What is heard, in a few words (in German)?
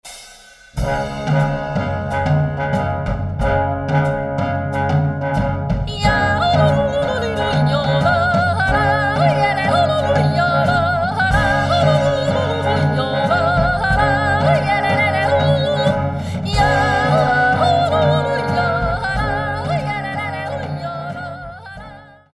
muotathaler zwiegesang